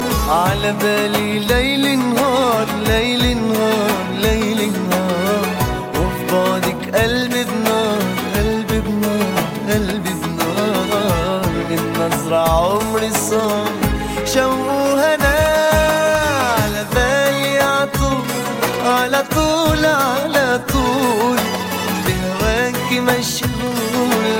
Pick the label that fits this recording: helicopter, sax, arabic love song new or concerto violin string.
arabic love song new